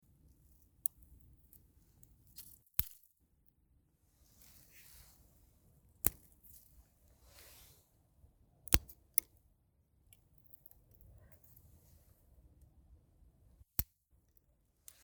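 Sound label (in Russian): отдельные легкие похрустывания веточек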